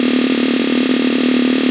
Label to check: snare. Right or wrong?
Right